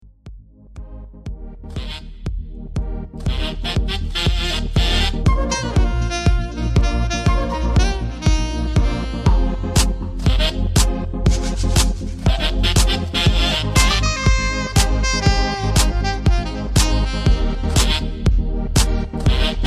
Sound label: Sax